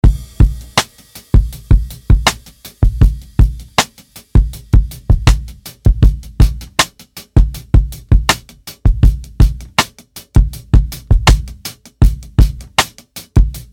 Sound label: ECHO CHORUS; FOX ECHO CHORUS AB